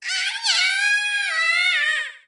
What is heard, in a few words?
korok runaway